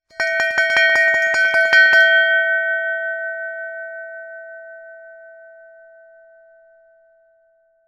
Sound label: BELL BRASS